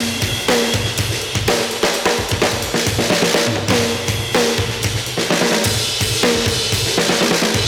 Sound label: 125 Classic Breaks, 125; Touch Loops; ClassicBreakSessions